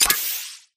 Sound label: sentry gun placement hologram on; coop; Titanfall 2